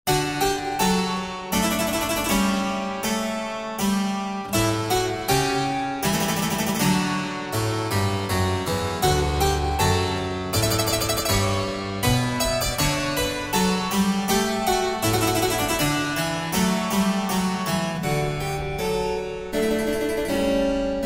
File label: Piano Pieces Minuet